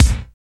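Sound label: BASS DRUM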